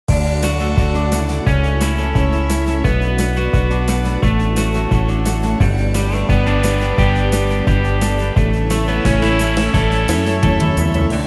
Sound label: Guitar Ballad